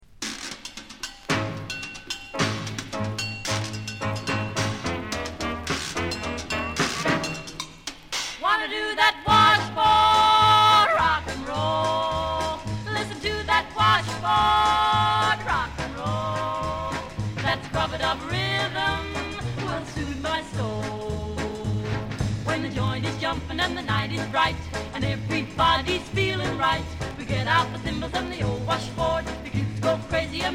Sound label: Washboard Rock n Roll